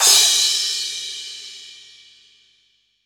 Crash Sample B Key, Royality free crash cymbal single shot tuned to the B note. Loudest frequency: 5559Hz; cymbal drum samples